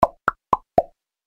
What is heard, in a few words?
Plops